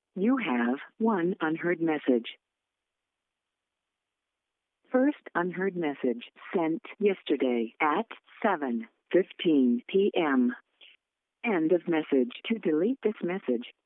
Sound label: Cell Phone Voicemail